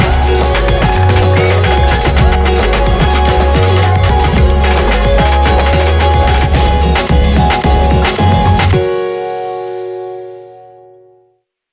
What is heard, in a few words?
Kicky Pop; Sony; Xperia Movie Creator Music